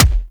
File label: Weapon Punch Rifle; Warframe; Weapons; Rifles